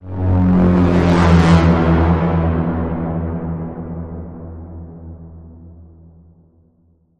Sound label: Bend, F sharp